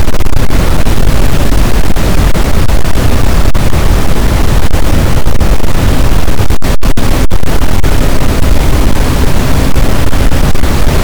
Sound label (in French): cachalot, boat; Physeter Macrocephalus